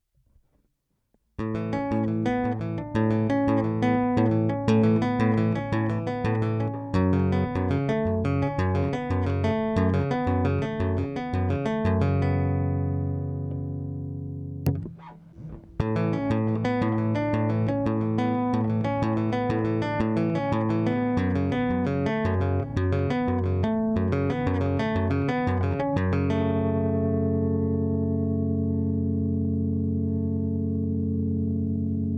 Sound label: Bass Station Tapping Dry Compressor